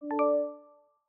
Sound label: Slide, iOS Sounds